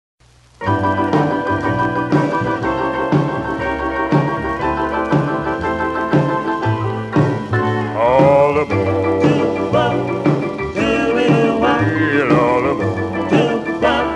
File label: Vocal Group Harmony